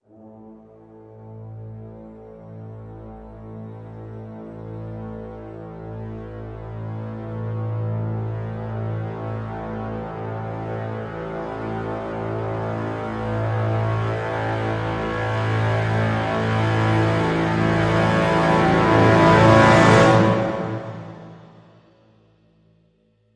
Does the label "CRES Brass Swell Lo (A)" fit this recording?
Yes